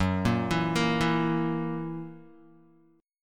Gb 7th Gb down Guitar; arpeggiated

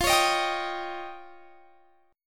F 7th Suspended 2nd Sharp 5th F; F7sus2#5; strummed; x,20,23,20,20,23

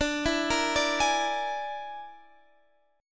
Bb 7th Flat 5th D

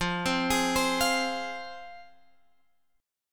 F Minor F down Guitar Open G

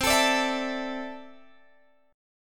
C 6th Add 9th, C, down, Guitar, Open G

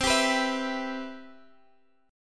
C Major 7th, chord; CM7 strummed

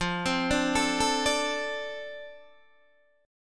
F 6th F down Guitar Open D

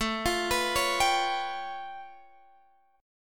A Augmented 9th A Guitar Open G

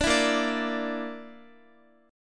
Bb Major Flat 5th Bb down Guitar, chord; strummed; JGuitar

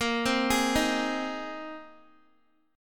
A sharp 7th Suspended 2nd Suspended 4th, Guitar; A#7sus2sus4; arpeggiated; x,13,12,13,15,x

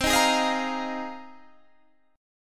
A sharp 7th Suspended 2nd Suspended 4th

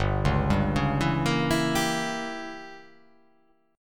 G Diminished 7th

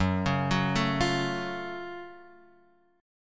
F sharp Minor Major 7th, guitar; chordshape; down

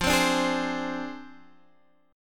F Minor 6th F down Guitar Standard; Fm6; strummed; JGuitar

